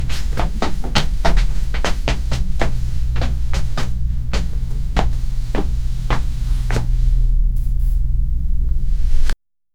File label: soft footseteps for fps